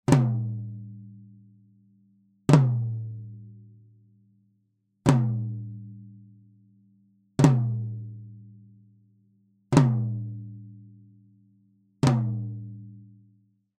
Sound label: Tom Flams